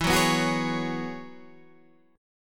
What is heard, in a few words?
F sharp 9th Suspended 4th E, chord